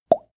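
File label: plop water drop, body sounds; koerpergeraeusche; menschen; soundpool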